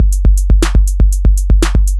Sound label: Groove Pizza 120bpm